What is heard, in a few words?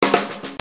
Ballroom Fill